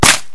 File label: broken bar; DoodleJump